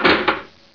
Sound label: TOASTER